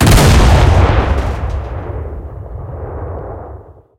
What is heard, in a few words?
cannon 130mm b13 shot, tanks; cannons; War Thunder